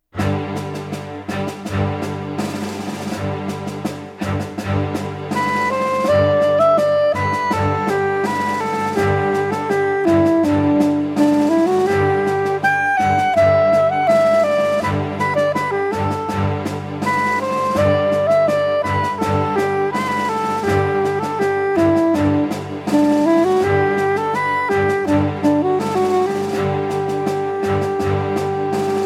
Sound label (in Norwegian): marsj; trondelag; skaun; brudemarsjer